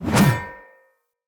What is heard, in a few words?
Two Handed Mace Whoosh E; Weapons; Enemy; Blunt; Sinecure Two Handed Mace